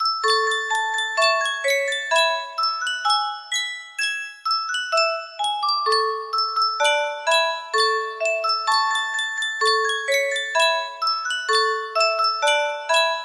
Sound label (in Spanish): sankyo caja de musica, music box melody